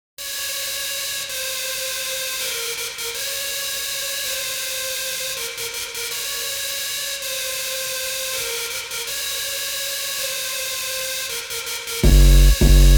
intro instrumental

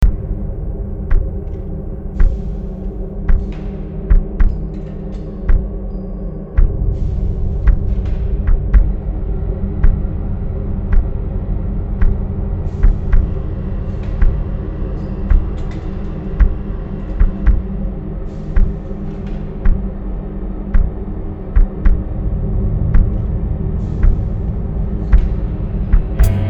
factory interlude instrumental

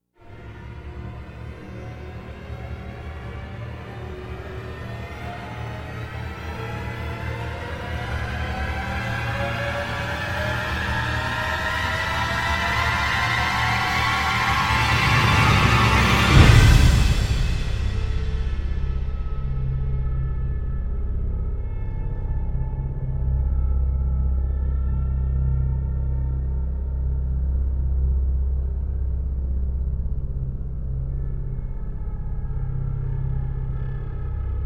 drone

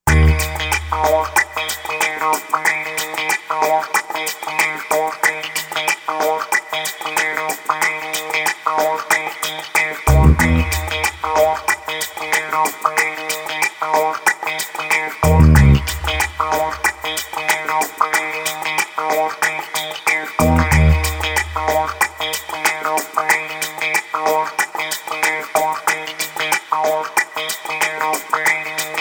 instrumental

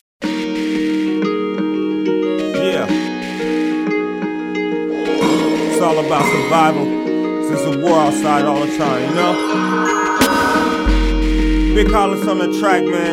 instrumental

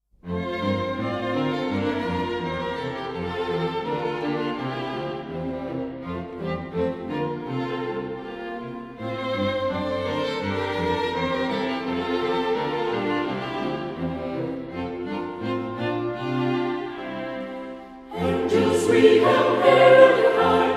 choir

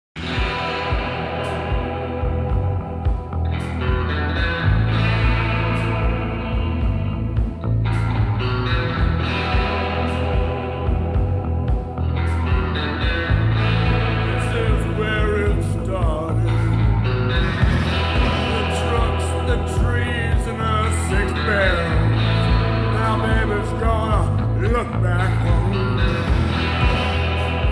six bells chime

